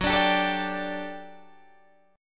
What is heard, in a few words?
G Suspended 2nd F sharp, Guitar; Gsus2/F#; strummed